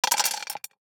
DICE on Glass, Throw and Roll, Standard, 5 Five Dice; Samples; ArticulatedSounds; DICE Sfx Party (Extended)